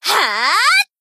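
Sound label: attack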